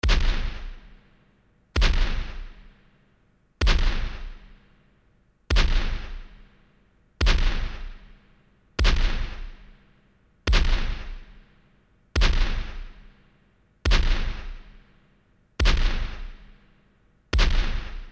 Rifle shots gun salute

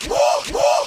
Woo Woo

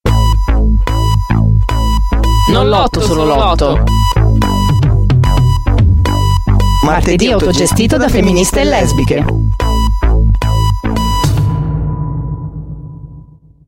jingle; 8 marzo

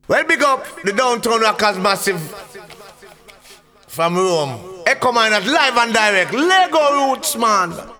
jingle

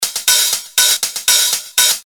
Hat Loop 120,000 BPM

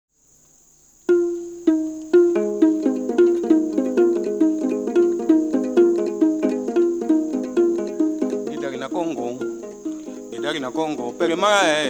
Gitari, forest music northern belgian congo; forest music northern belgian congo 1952

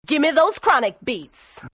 Beats

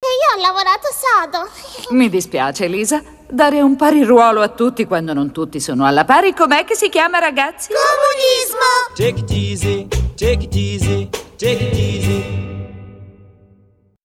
Jingle

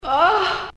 Augh

